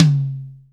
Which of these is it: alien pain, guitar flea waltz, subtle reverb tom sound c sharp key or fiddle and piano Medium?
subtle reverb tom sound c sharp key